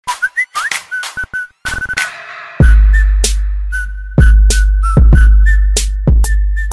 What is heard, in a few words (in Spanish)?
silbidos whatsapp remix